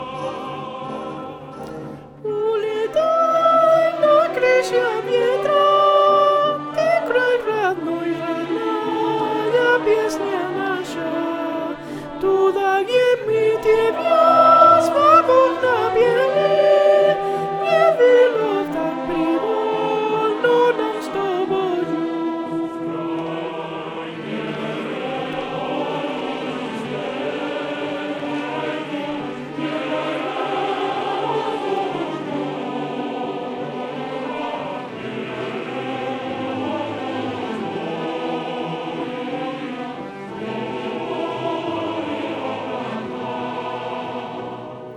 SOPRANOS II; REPERTORIO ÓPERA; Danza Igor

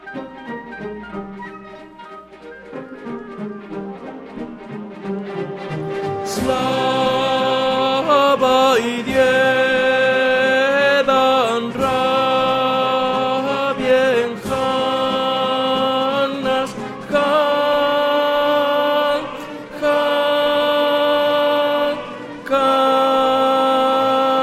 Danza TENORES I; REPERTORIO ÓPERA; Danza 3 / c267-368

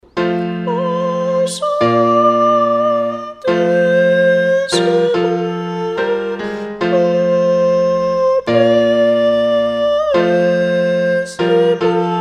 O santissima Sopranos; musica sacra

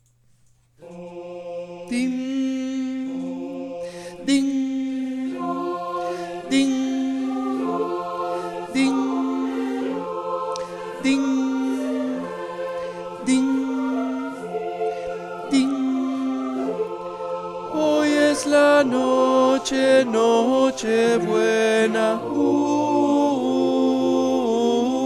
tenores

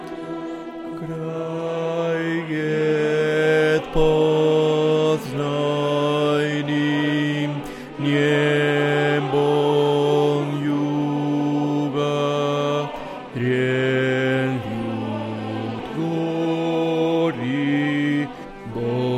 Danza BAJOS; REPERTORIO ÓPERA; Danza 4 / c369-c432

